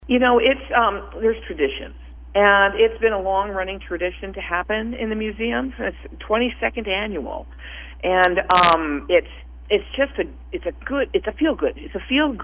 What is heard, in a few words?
Accordion festival; historical museum hosting annual accordion festival